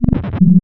dog phrase; npc; Half Life 2; Episode 1

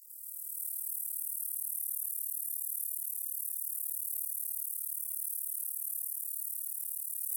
cicadas; ambient; survival

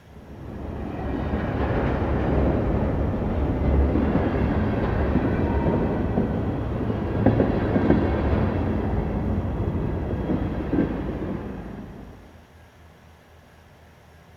train distant pass

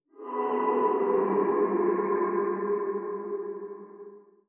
idle moan wet, zombie vox; creatures

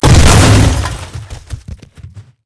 sled; ambient; Half Life 2; Episode 2; outland

